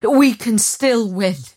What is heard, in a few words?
attack pain; dota; mirana